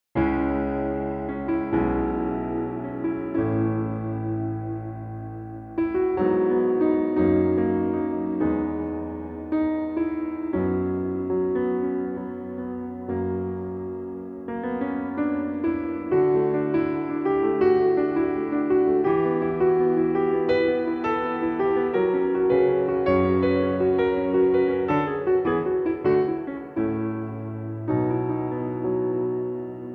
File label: Rain - solo piano